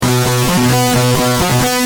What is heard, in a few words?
G Pump, Guitar; Industrial